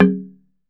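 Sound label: TUNG LO, Tongue Drums, Pitched Percussion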